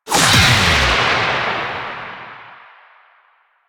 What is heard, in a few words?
Choir Erupt Alt Fire; Warframe